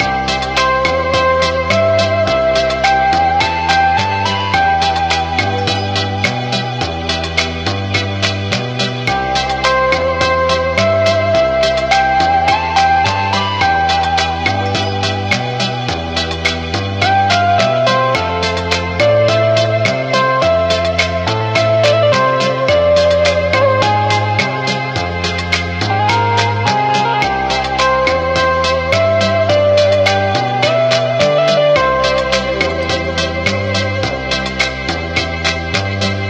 muzak low; ionfury

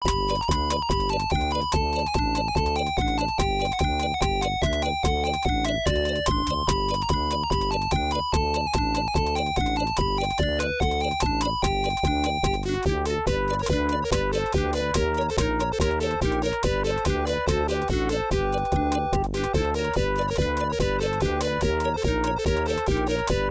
Bells; cosmo

